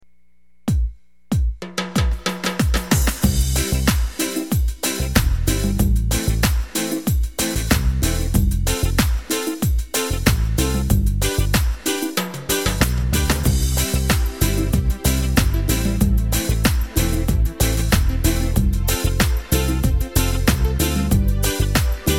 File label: reggae; yamaha